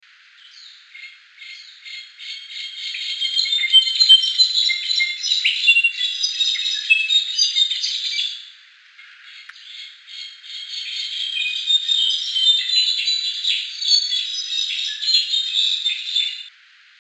Brown backed Solitaire

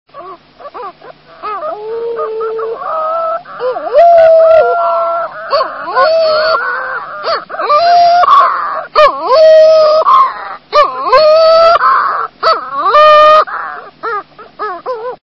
Flesh footed Shearwater

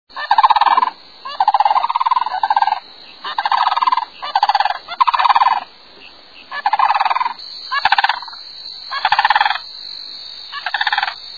Sandhill Crane

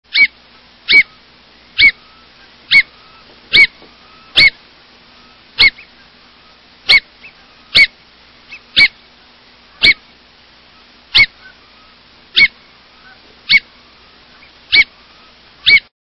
Hudsonian Godwit

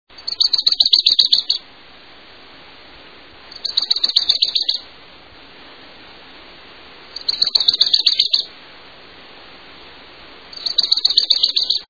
Wilsons Warbler, songs

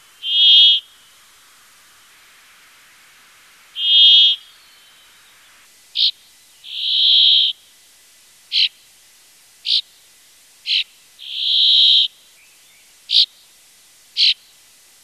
Brambling

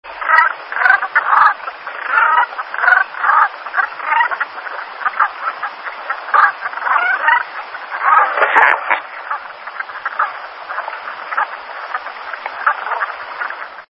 Brant

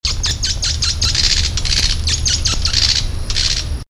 Green Kingfisher, songs

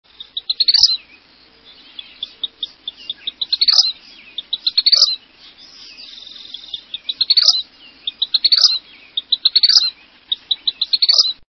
Vermilion Flycatcher, songs

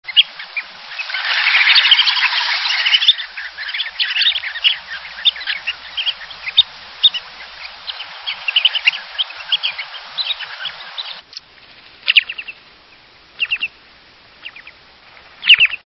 Short billed Dowitcher, songs

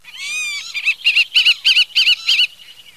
White tailed Hawk

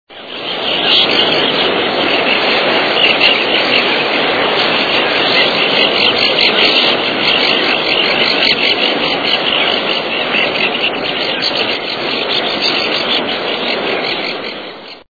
Least Auklet

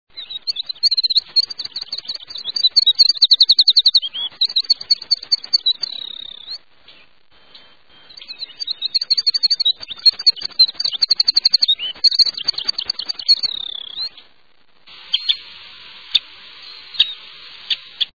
Winter Wren

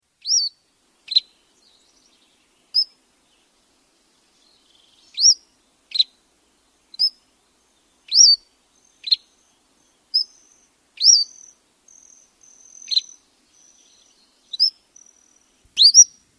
Cordilleran Flycatcher

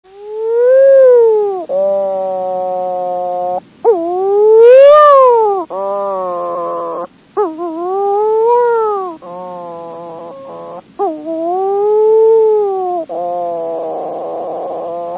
Wedge tailed Shearwater

